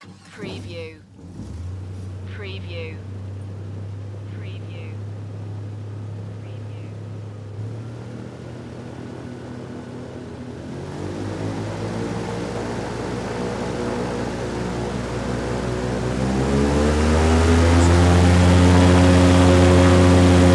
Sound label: Air Boat Start Drive; sound effects bible